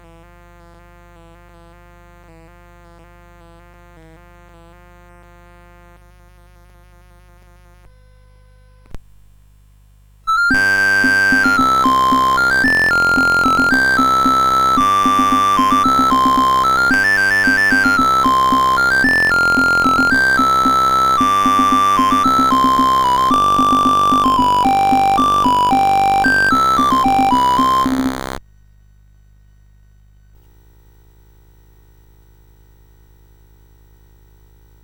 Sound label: Courante, CSG8580R5